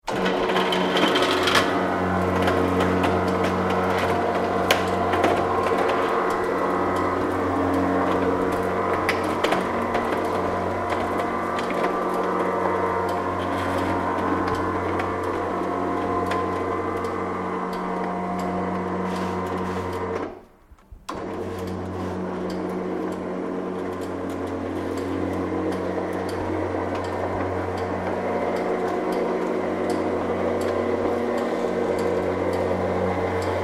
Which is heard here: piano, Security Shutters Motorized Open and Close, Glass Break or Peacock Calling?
Security Shutters Motorized Open and Close